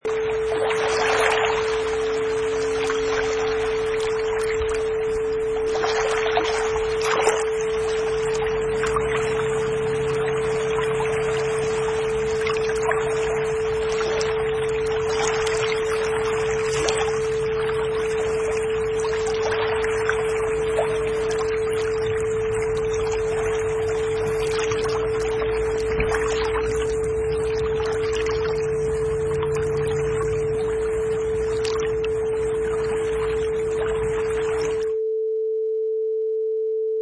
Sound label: Lake Waves